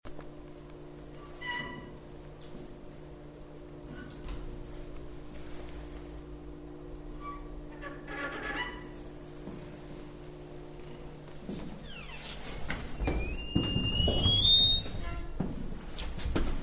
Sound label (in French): ascenseur